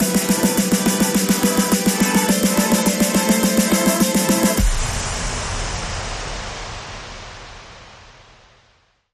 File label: Bumper